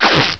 Klebeband; Bip Boing & autres

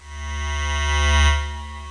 Gitter

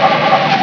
vollbrems; gp500

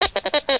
hupe

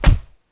Besenstiel; BW FLUR

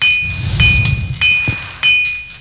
Schmied; PC Gamer IT CD 48; 1602 AD; 1602ad demo

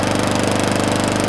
Mäher Loop; Loewe1

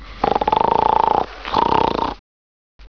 schnarchen

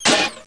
Statue An

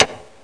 KLICK; TKKG 2

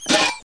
Statue Aus

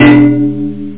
pruegel

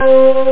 E-Orgel

